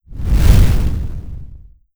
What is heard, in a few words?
explosion; NIIIEMAND's explosion sfx